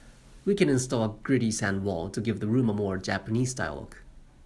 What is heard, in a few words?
Rock gritty